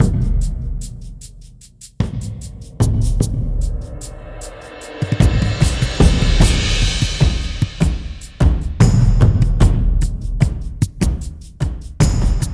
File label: Perc Scotsmen